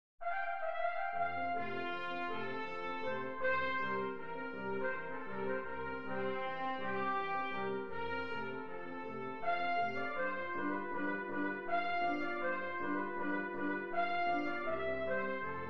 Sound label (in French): menuet quintette cordes; boccherini luigi